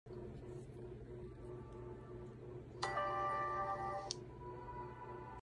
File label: ps4 notification; اعلان پی اس 4